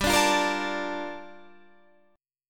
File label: Bb Major 7th, G; Bbmaj7/G strummed